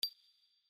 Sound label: bip